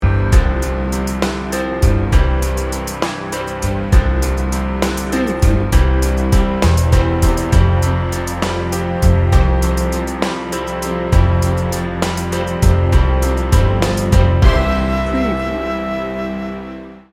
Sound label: ballad bed 15 second